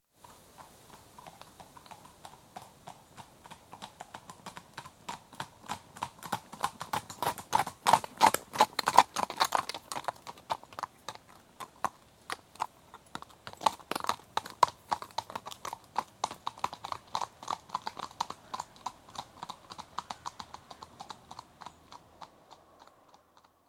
Two horses at trot on tarmac, approach, stop & depart, left to right, some wind in trees; Horses Trotting; BBC